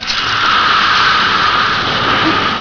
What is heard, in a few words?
alien monster; Marathon revisited